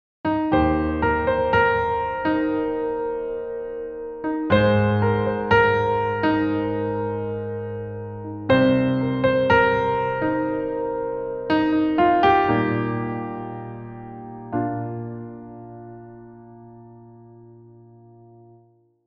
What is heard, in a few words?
Jingle